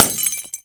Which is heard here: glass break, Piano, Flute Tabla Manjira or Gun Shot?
glass break